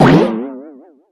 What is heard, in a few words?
Comedy Timpany Sound